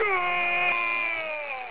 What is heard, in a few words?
goal; soccer